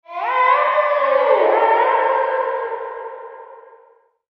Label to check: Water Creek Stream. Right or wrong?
Wrong